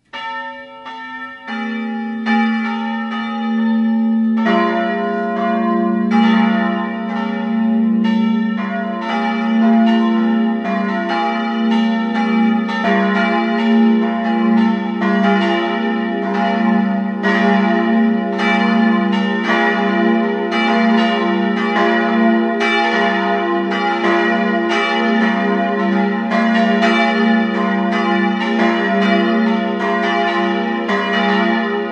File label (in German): Erasbach, Glocken